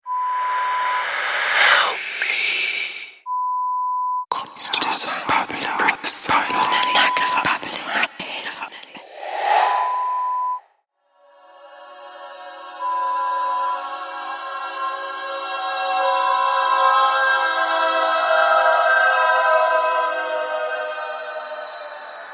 Ghostly Voices, Horror Sound Effect

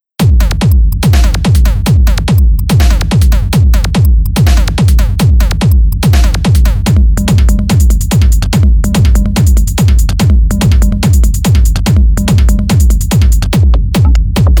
hard trance loops

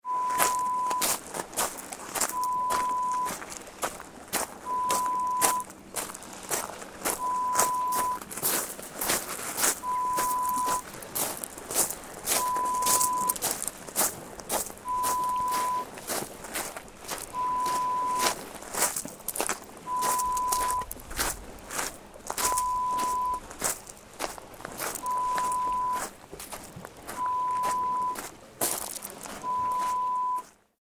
Footsteps Pebble Beach

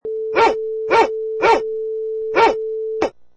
Big Dog Barking

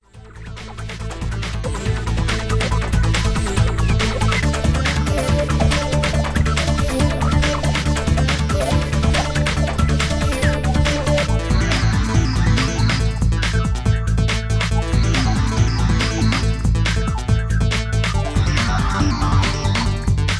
Electro Ambient

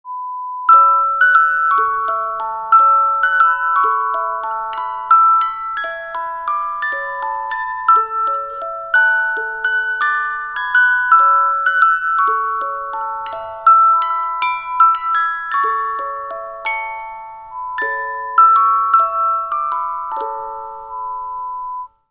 music box sound

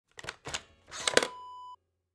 camcorder battery remove

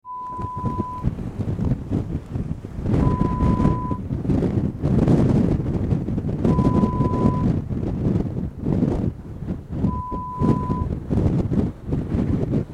wind sound

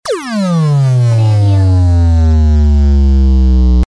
Photon Gun or Blaster

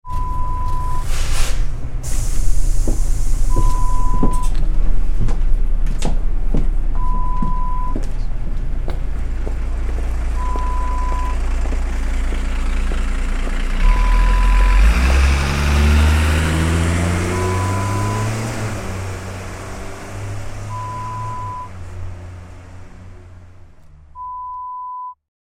Getting off a bus sound

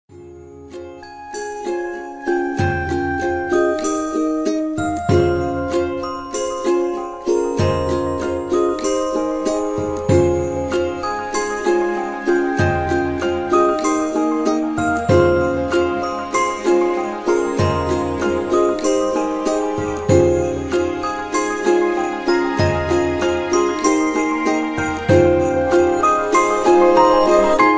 Magical Ukulele 96bpm

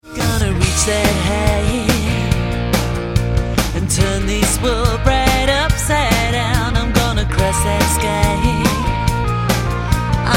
Twist

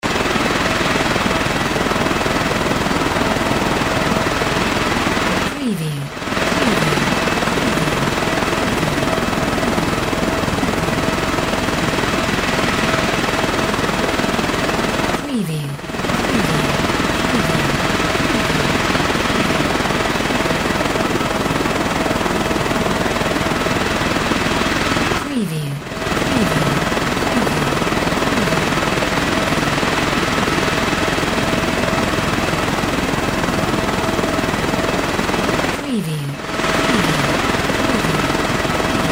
HELICOPTER SEA EXTERIOR; windy beach audio

